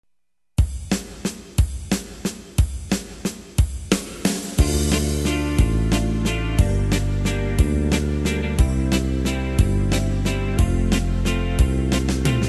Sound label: Valse; yamaha